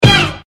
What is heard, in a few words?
boost; Jet Set Radio movement sfx